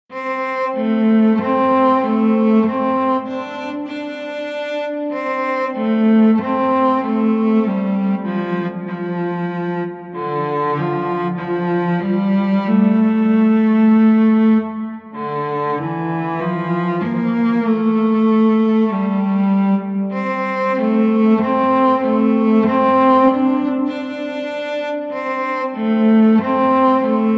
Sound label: Cello; gekiban